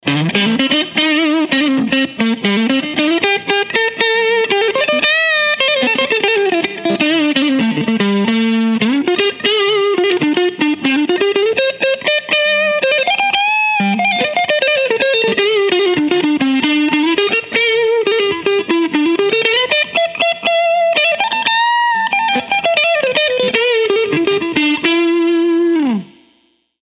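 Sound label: prs neck clean lead; prs22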